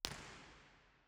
First IR Sala 2800 Genelec Left BIN, Binaural; Santa Cecilia Roma; Measurements 2005; Soundfield

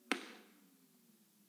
IR sweep; Teatro Benevento; B-format

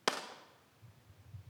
IR clappatore; Teatro Benevento; B-format

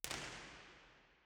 First IR Sala 2800 Genelec Right WY, Soundfield; Santa Cecilia Roma; Measurements 2005

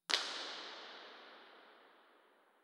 IR sweep BIN; Bergamo; Cathedral Sound System; IMP-RESP

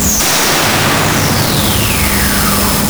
Comunale FE L, 3D Imp Resp

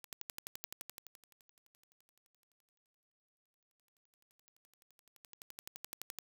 Turntable; Rode

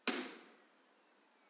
IR sweep

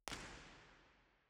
First IR Sala 2800 Genelec Right BIN, Binaural; Santa Cecilia Roma; Measurements 2005; Soundfield

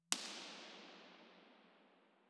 IR sweep; Bergamo; EAW100; IMP-RESP

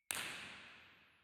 Auditorium, Theatres; Fila18

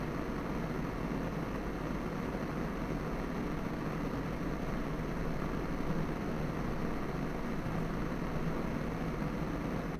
Signal Noise

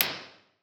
curtain closed

